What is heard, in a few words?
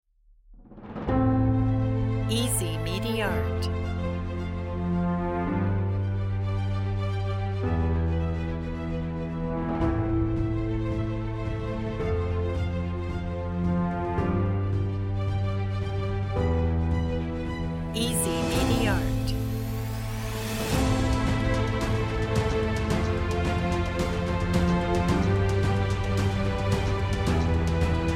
epic opening